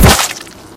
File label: flesh